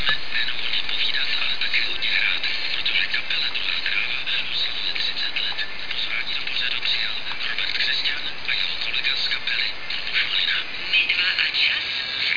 MW 639 khz abends

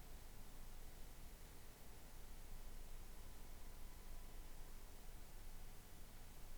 Trafo Unbelastet

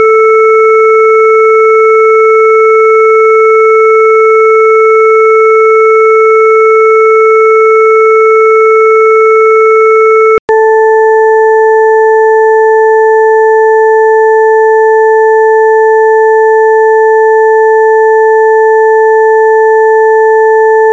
Obertoene

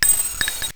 PWM zu LineIn; WT588D-U Voice Module

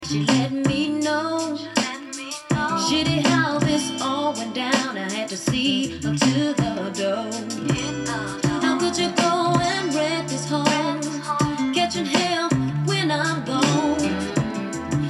Tiefton

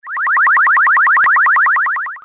Wobbelton

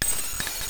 DAC zu LineIn; WT588D-U Voice Module

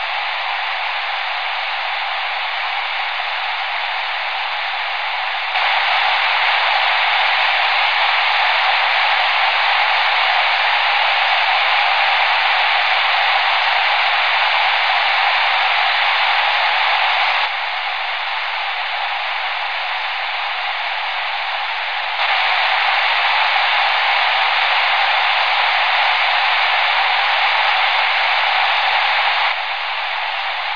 UKW Empfang am Zweitradio NF Rauschen ohne und mit Pendler

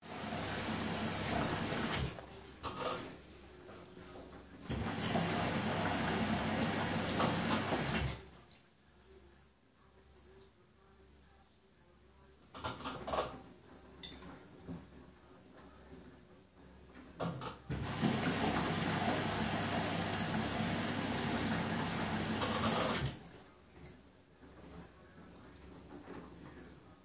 Waschmaschine

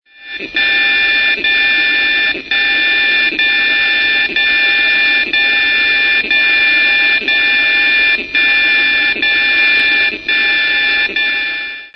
DCF77 gut

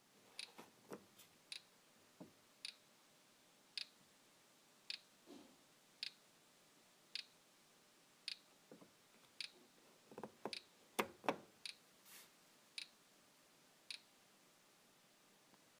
Trockner

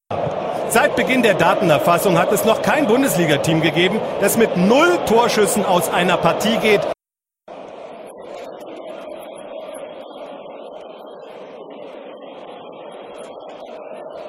fussball

